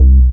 DM BAS, Dance Mega Synth

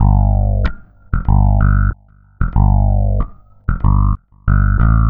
Bit Reduced Bass, Electric Bass; Sonic Foundry (Sony Creative Software); Leo Cavallo Bass Taster

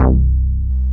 BAS, Eurotech; Sounds Good

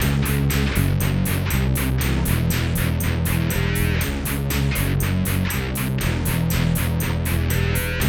FAT ROCK

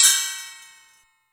Metal Dip; Yamaha DTXpress; Effect 1

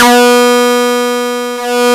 C5, synth; 90 s Sample CDs; NiceBeats; SynthLine VOL-29

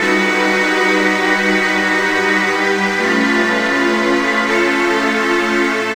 Strings Suspender; Sonic Foundry (Sony Creative Software); Knutrix Drum & Bass

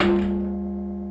SY77 DRUMS; TEMPRA; Crypton - DrumBase5000

